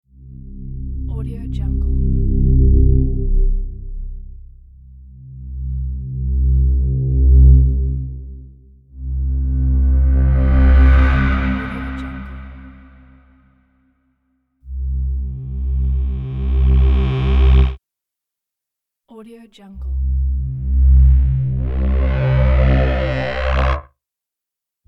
braam; jtcstudio

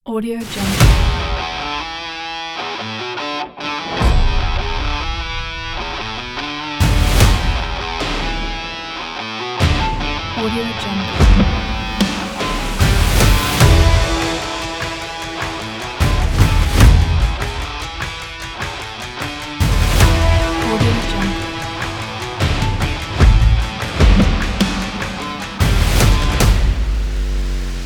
rock action kit

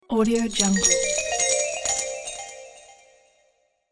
treasure gold revealed

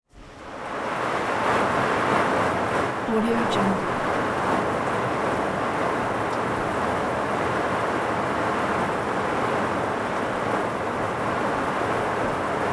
rain on vehicle roof interior; prosoundfx

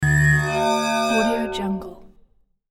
whoosh scanning beam; hollywoodedge

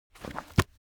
object book heavy book shut; smartsoundfx

